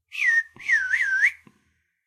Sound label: Whistler bird